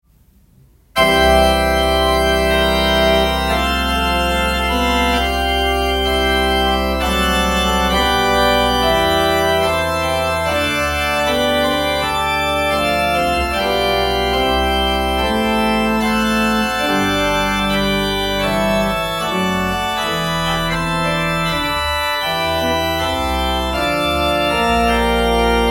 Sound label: Mayer, pipe organ